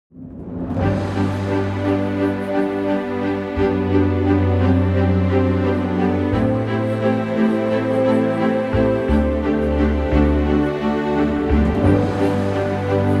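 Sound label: orchestral G; key - G - vocal range - F# to G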